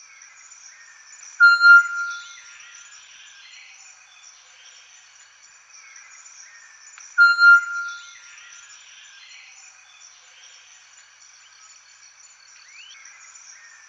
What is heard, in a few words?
solitary tinamou